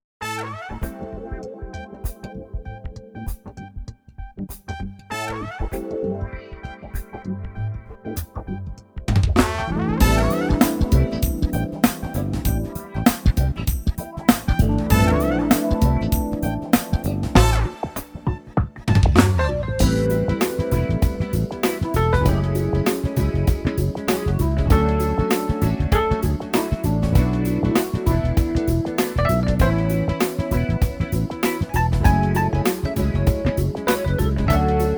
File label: Kool Funk